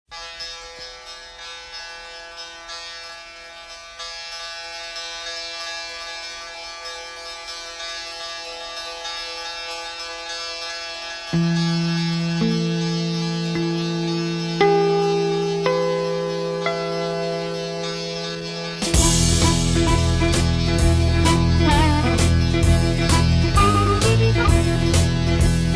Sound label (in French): Accords